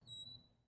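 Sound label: Front Door Hinge Squeak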